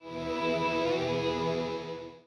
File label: Riff Repeater Level Up; Rocksmith